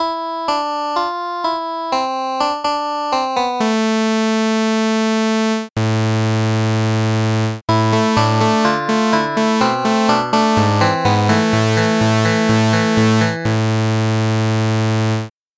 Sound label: charang